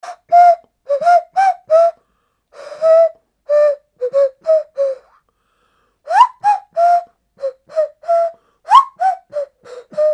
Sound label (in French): Flute a nez